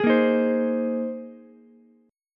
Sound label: Bb 9th Suspended 4th Bb down Ukulele C6; strummed; JGuitar